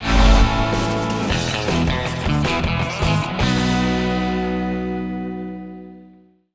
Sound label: Scene Complete Multiplayer